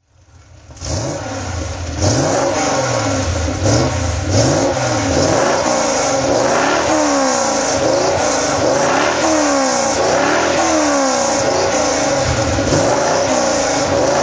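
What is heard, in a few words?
Maserati Ghibli SS throttle, Maserati Ghibli SS 4.9 litre, 1970; Engine sound; V3D/ ClassicarImages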